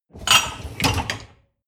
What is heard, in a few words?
dishwasher upper tray draw out short